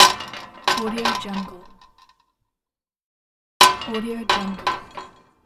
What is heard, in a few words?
fence door close